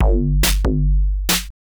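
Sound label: DRUMLOOP ELECTRO 140; Techno2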